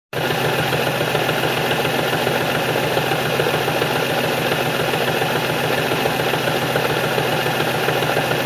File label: transmission thudding noise 350z trans thudd